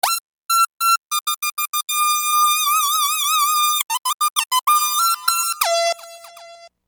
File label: Keyboard